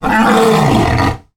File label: african lion roar